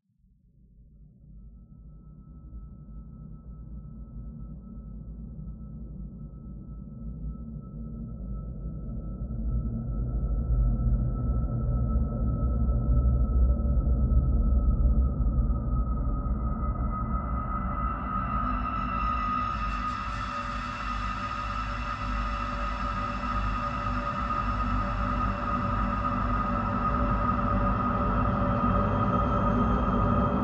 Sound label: Ambiance Dark Pad